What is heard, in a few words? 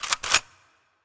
Aerobot Shot Reload